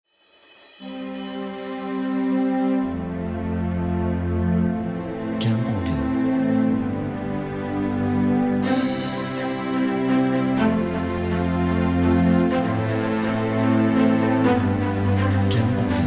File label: Orchestra Intro Logo; Orchestra Intro Logo Pack